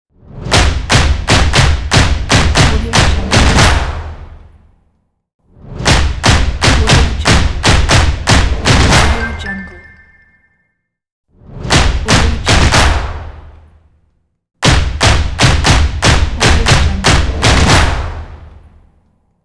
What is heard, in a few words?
typography dynamic stomp logo